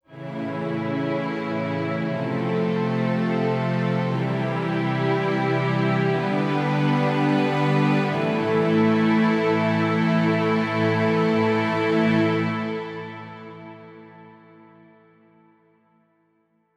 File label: Intro, Solemn but powerful opening